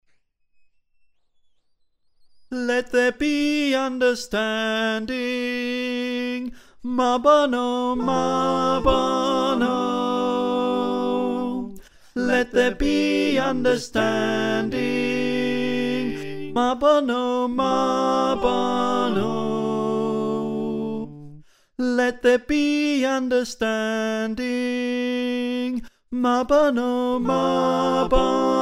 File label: tenor, choir